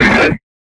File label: HUSK death